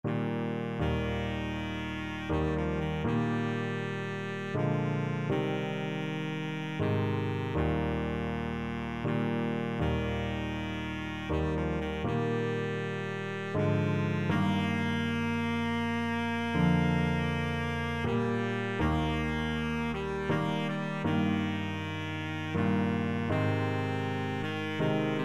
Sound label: baritone saxophone